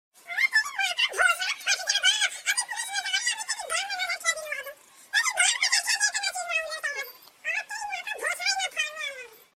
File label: high pitch